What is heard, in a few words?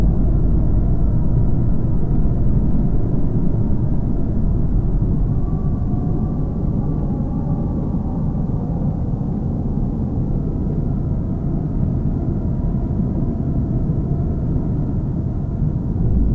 Ambiance; Knytt